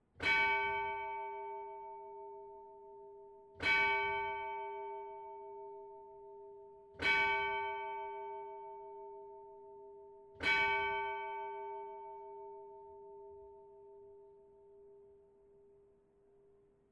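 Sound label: bell Primglöcklein; southhelm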